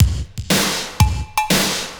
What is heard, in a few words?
120 SOUL, DRUMS; Best Service Advanced Media Trax Modern Composer